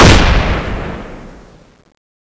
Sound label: mgsm, weapons